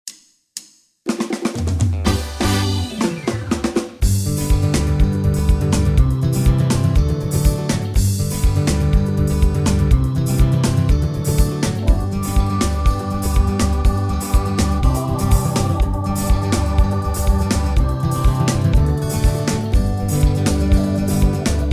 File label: Township Jive